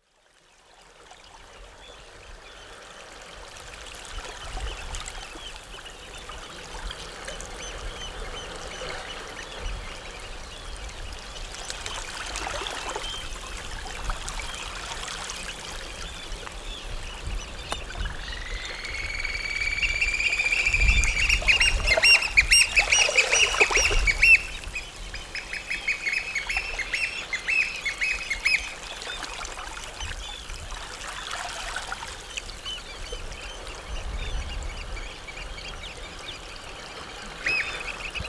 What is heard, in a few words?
soundscape; Mousa; sense of place